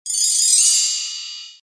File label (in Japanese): chirichirin